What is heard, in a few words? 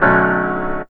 CHORD L, Drum & Bass 160 BPM; Ueberschall; Drum & Bass Flash Trackz